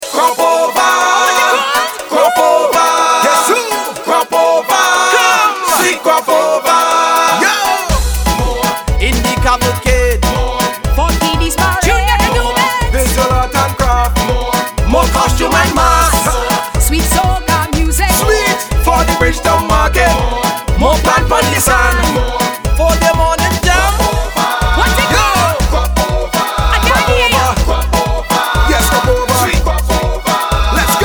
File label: jingle; Cropover; sweet soca